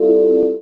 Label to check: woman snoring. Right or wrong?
Wrong